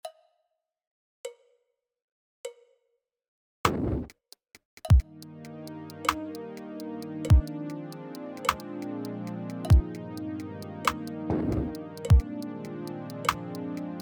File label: 50bpm